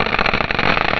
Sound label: tick